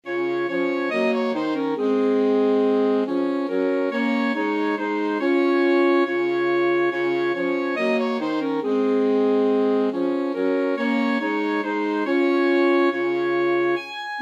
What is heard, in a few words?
ding dong merrily alto sax trio